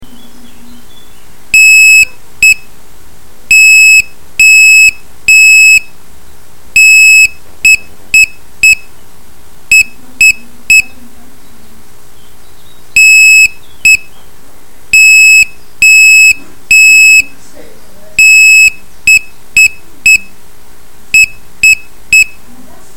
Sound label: morse